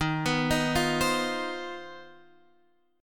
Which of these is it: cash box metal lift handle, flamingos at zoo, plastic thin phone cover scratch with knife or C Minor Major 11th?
C Minor Major 11th